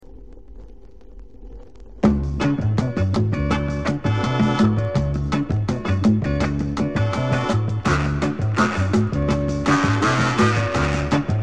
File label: Bassa Beat